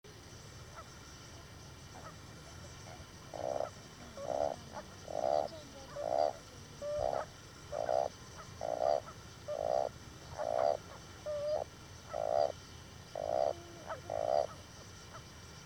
Bufo eichwaldi

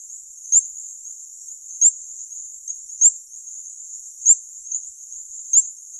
Stumpffia tridactyla

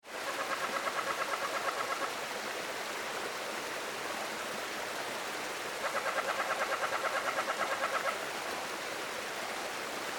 Hyla arenicolor

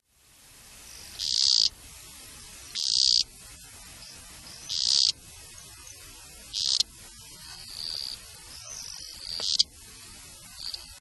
Blommersia kely

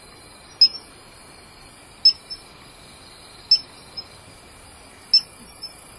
Stumpffia nigrorubra

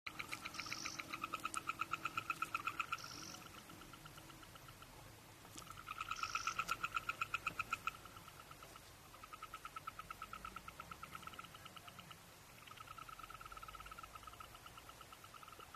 Euphlyctis cyanophlyctis

